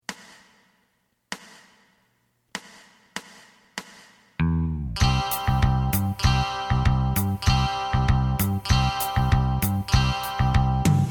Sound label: lead